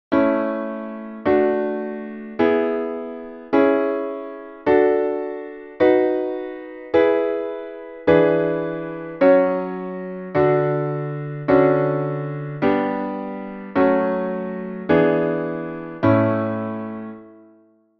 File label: Piano